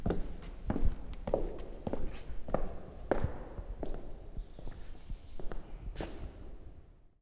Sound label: Wooden Steps